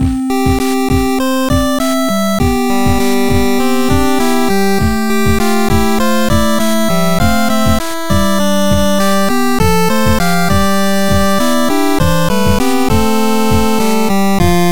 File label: All Clear; megaman 25th anniversary final mix